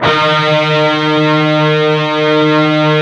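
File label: PRS power chord E 4 R, guitars; PWR CHRD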